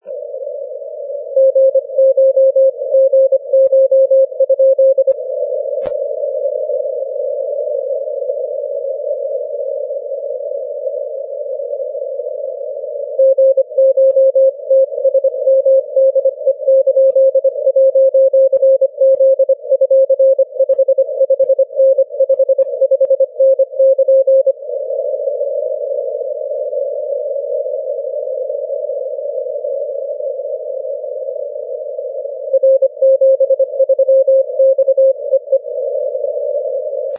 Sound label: cw; 6m